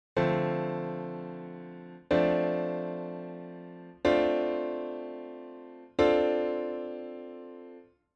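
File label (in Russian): обращение септаккорда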